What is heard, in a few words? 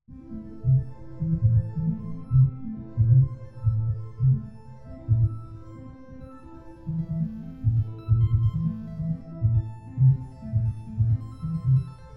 bleeps everywhere binaural